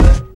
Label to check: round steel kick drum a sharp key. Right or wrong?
Wrong